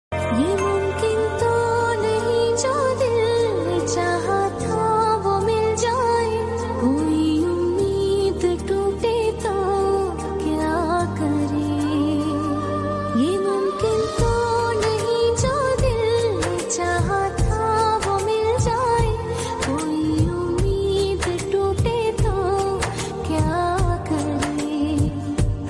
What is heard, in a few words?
female sad song tone ringtone, bollywood ringtones